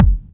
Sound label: sharp bass drum sound F sharp key; kick drum samples